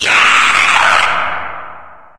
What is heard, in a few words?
stalker scream; npc; source engine; episodic